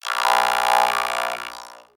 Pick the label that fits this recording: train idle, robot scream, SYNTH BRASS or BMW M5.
robot scream